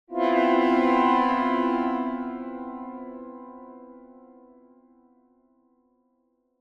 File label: Symphonic Strings Suspense Chord - Higher; Hollywood Edge; sound designer's toolkit v2